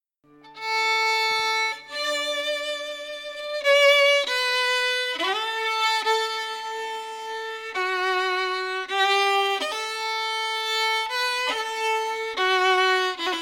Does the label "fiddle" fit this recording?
Yes